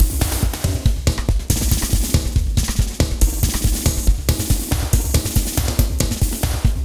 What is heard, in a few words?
Wild Ride 140